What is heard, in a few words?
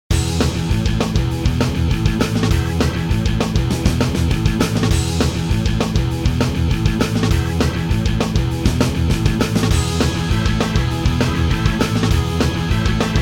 Pop Punk Instr